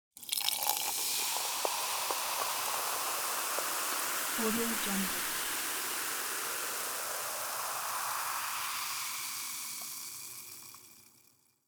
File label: pouring a fizzy soda